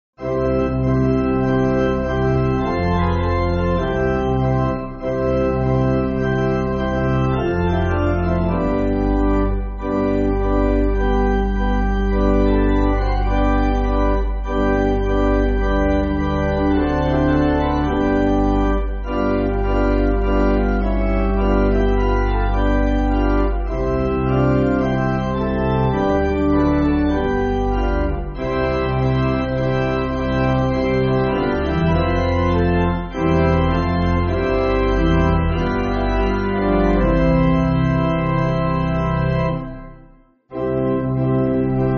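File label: Pipe Organ, Backing Files for Churches; O Lord You Gave; Randrianjafy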